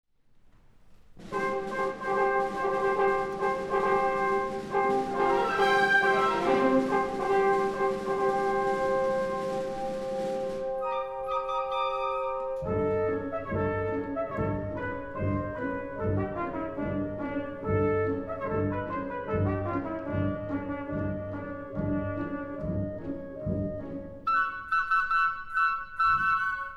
Suite for Small Orchestra

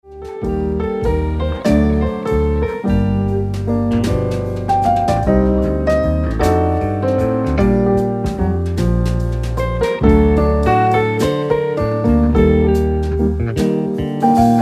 Valse

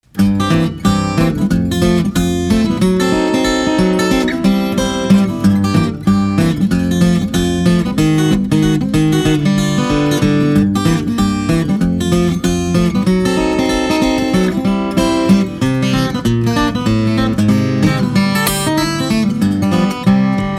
Buck Dance Rag Spanish Dance

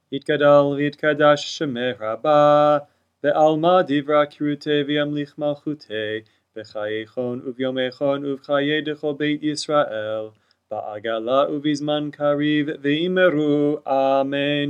kaddish shalem

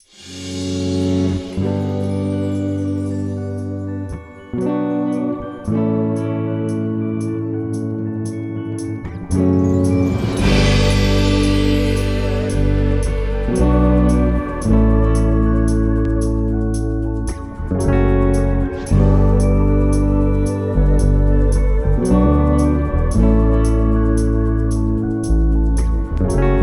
Inspirational; Jockey Underwear comercial

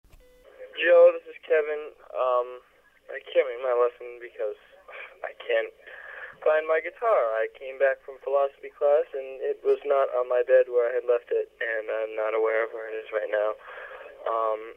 Guitar

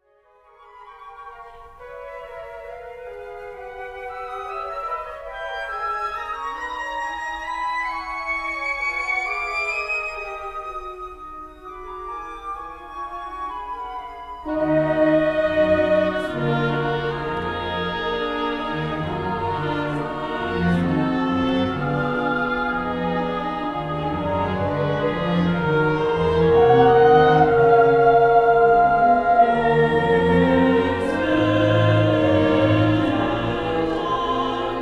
Orchestra; Stravinsky Psalms 2; Craft

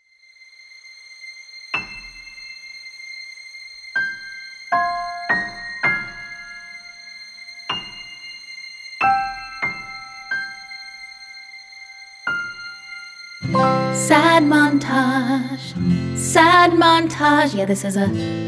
Sad Montage

